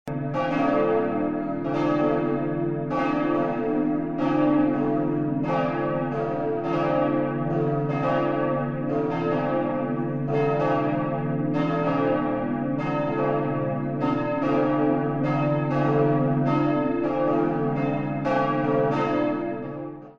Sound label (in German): unsere glocken